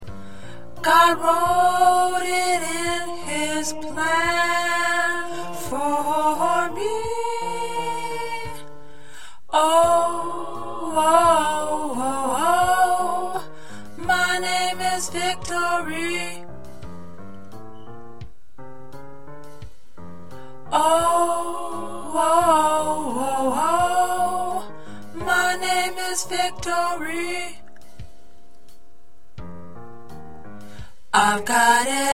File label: Tenor, choir parts